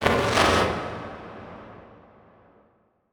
Transition Hit Impact Electrical

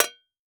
Impact Shovel Hit By Metal Sword

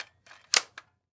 Weapon Gun Rifle M16 Clip Insertion; AdobeCC

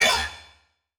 Animation Short; Multimedia Internet CD-Rom Flash

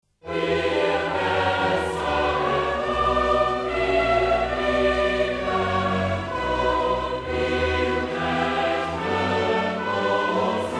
chorus

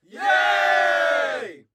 Human Crowd "Yeah"

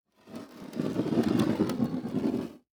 Impact Brick Drag On Wood; Impacts

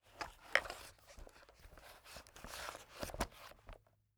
industry tool removing from tool belt

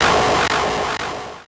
Title Transition Electrical Zap; Production Element Title Transition

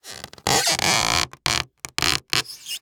Cartoon Balloon Rubbing

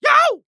Human Male Yell Yow Excited Fast

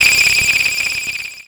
Animation Electronic

